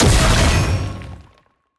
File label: Enemy Attack Heavy Hit; bloom minigame